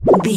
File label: sci-fi weapon ray gun laser small fun